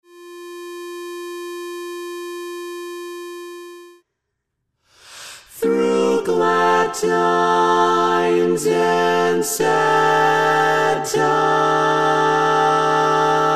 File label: SATB TENOR LEFT; Tags